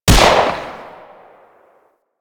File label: Cowgirl Pistol 2D Shot B; Tenno